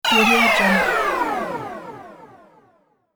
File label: game teleport vanquish